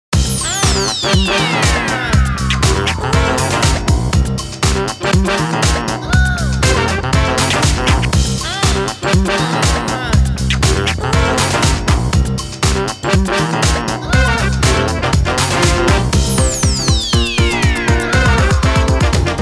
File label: JIVE SQUEAL